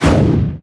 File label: checkpoint pulse; poke646